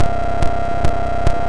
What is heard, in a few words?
beat